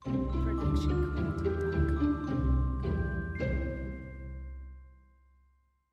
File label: Friendly Ident With Bells; SoundsCrate